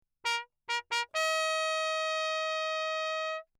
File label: TRP MUTE Bach signal; Perinétventiltrompete; CrownMess